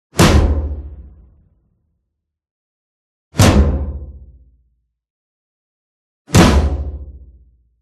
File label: CARTOON PERCUSSIVE Percussion; smartsound